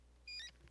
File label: Broken Squeak, rat